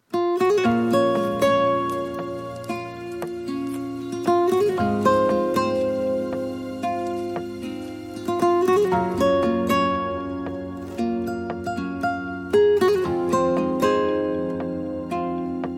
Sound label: acoustic Version